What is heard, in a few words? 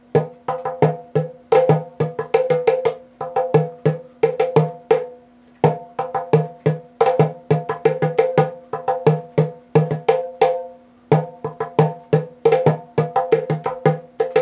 Basic Hand Drum, gourd drum